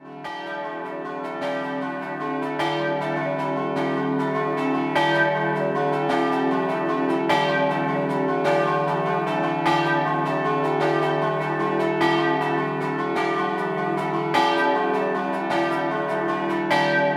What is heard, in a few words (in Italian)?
Capriva, campane